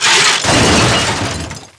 crush wall

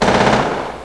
gunshot

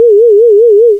bleeper

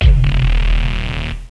teleport

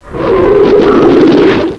alien scream

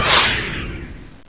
shot

